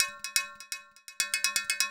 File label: PERCUSSN ETHNO 125, Drum